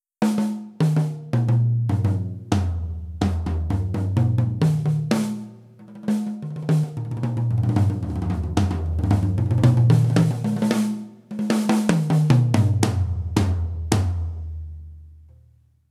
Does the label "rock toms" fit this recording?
Yes